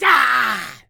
voice male battle shout short; Gamemaster Audio; Human Vocalizations

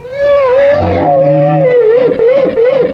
alert far, fast zombie; hl2

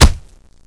punch hit; hl2

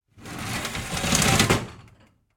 Draging Kitchen Cabinets; Kitchen Sounds 1.2

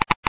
geiger; hl2

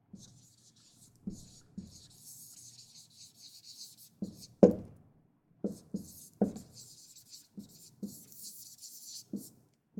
Whiteboard, marker, write, cursive; UberDuo; The School Audio Playset

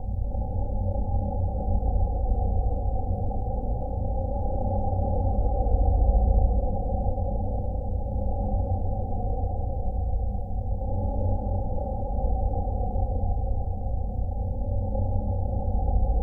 Interior Tones, Abstract; Digital Rain Lab

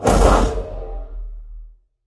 shove, antlion guard far; hl2

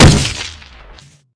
footstep, strider; hl2

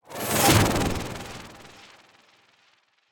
Transition Flanger; MatiasMacSD; The Transition

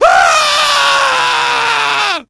scream long; hl2

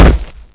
vort foot; hl2

